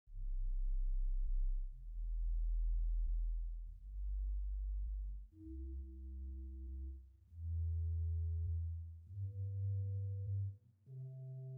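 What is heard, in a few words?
Swell Lieblich Bourdon 16 arpeggio, organ; Hauptwerk; St Annes Moseley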